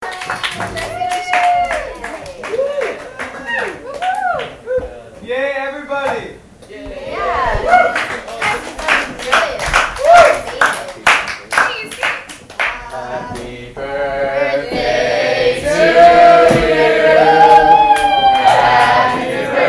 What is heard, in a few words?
happy birthday and applause